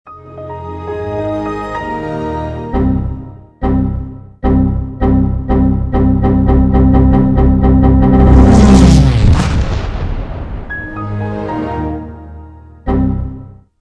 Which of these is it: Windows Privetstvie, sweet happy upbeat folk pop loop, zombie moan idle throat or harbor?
Windows Privetstvie